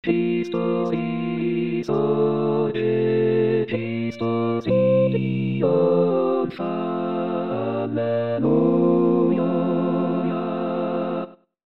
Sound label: Cristo Risorge Coro; pasqua